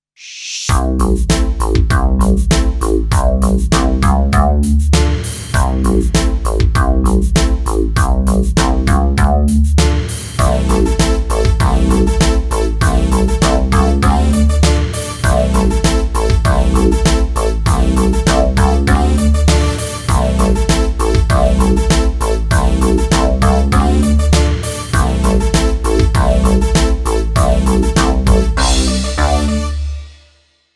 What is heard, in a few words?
Jingle